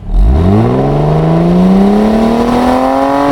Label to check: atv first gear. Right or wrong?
Right